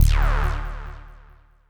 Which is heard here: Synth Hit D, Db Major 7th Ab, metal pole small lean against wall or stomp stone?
Synth Hit D